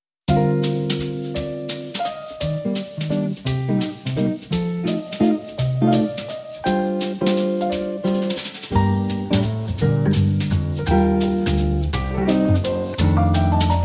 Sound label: In B-Flat Major, Jazz Ensemble